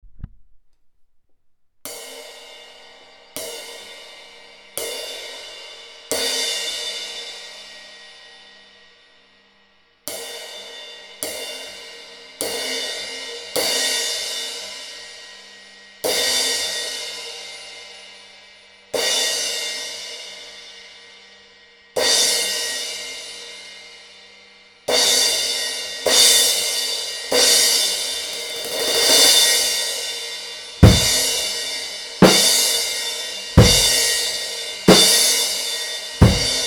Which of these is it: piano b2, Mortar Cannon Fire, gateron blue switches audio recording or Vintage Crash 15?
Vintage Crash 15